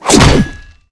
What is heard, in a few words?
axe1